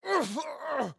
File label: AHurt